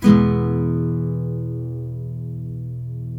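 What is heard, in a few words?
NYLON, E MAJOR UP, CHORDS; Spectrasonics; Hans Zimmer Guitars VOL-1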